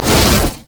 mage chains; LoL; ironforge xerath

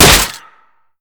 basicattacks; Zyra

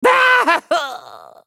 Twitch dying

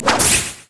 double strike

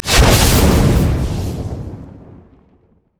slicing maelstrom; LoL; kennen

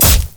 basicattack; LoL; irelia

